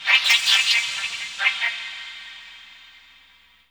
SPACE NOID, Human; Distored Reality

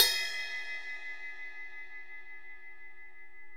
Ride Bell mf up; Digital Sound Factory

